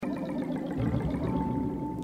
underwater; pikmin 3

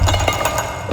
door cant open; We Were Here Forever

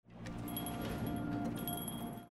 bellring; gris

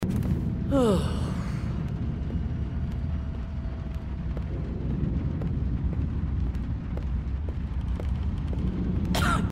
enemy cough walk

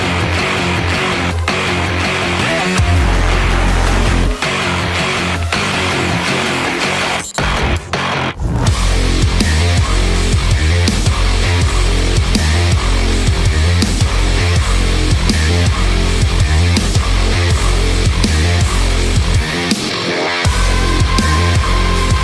music meathook; Doom Eternal

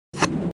powerup pickup

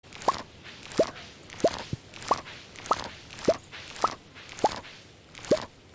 multiple pickups; Stardew Valley

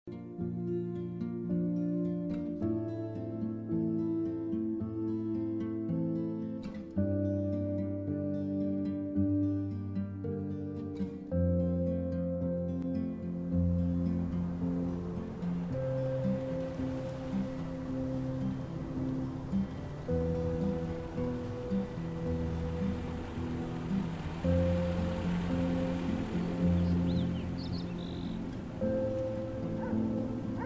menu music; Life is Strange 2; gamesoundopedia

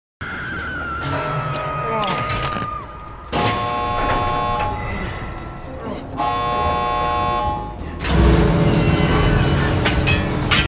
gate; Dead by Daylight

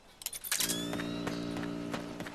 activate solid eye; mgs4

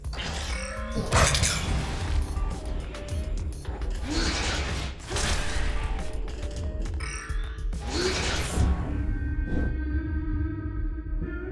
combat; Transistor

